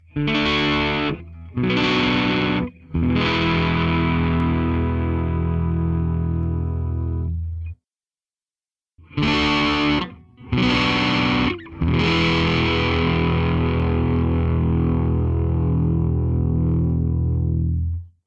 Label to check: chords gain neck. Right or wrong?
Right